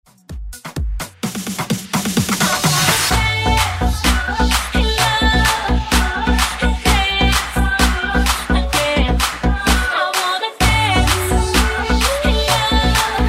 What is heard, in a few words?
Afrobeat Redrum